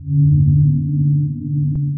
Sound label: sonar Ping Air Far; Barotrauma; Real Sonar